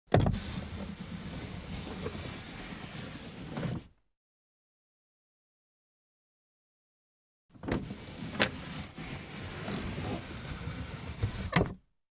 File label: Car glass lowered, raised manually